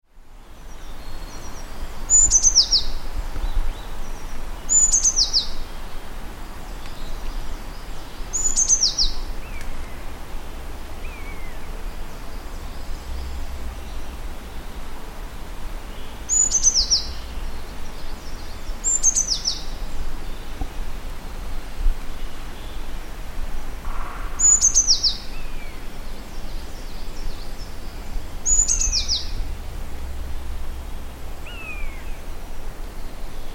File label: Cyanistes caeruleus; SETE